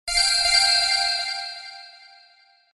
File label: Notifier Ambient; V710 Ringer